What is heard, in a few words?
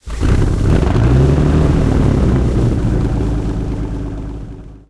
comet engine int start, tanks; War Thunder